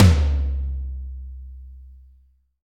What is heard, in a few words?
TOM, Drum Dry; East Collexion; Drum 1